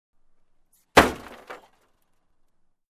Wood Slam Sound

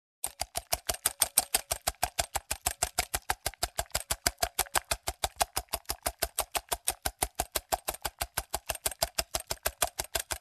Cutting fast

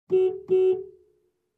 Car honking twice outside

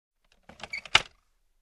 Small compartment close

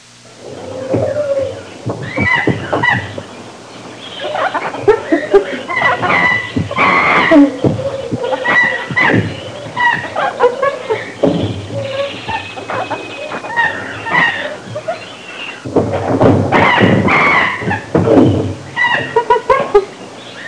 Jungle monkeys screaming

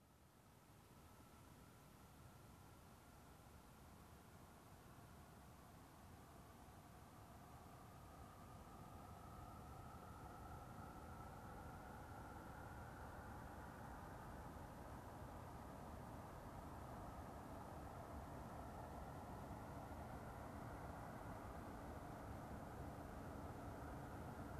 Car approaching and parking

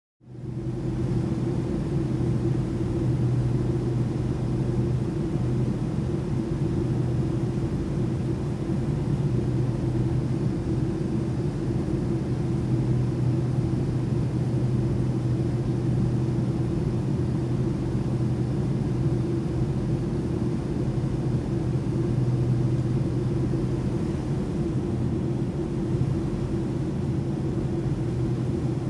Aircraft Cabin Aura

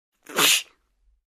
Male Smirk

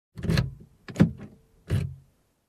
Unlocking Car

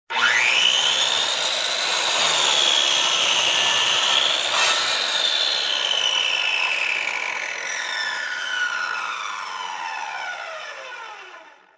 Electric Hand Cutting Machine

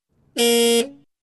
Funny Car Horn

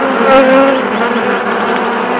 Bee swarm sound

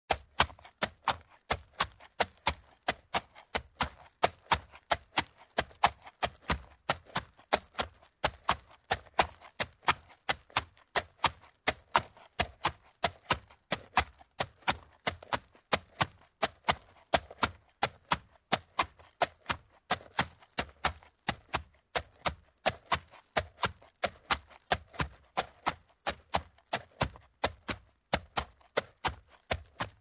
Horse Gaits on a sand surface

